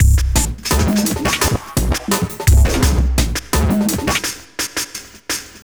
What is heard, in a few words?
Beat, Futurist Drum & Bass; Drums & Percussion; Beats